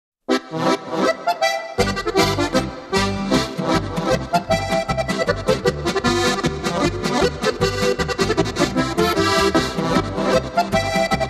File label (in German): polka